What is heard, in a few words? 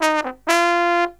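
trombone, Killer Brass Riffs; USB Soundscan vol.29 - Killer Brass Riffs; AKAI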